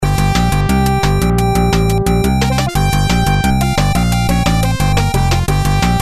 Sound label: PC-9801